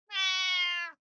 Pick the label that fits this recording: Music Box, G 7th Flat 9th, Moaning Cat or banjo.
Moaning Cat